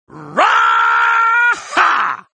raaaaahaaaaa

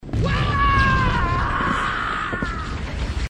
death

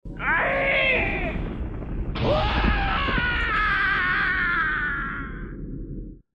death

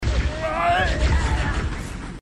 death

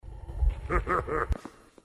elite laugh

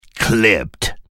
birdstrike

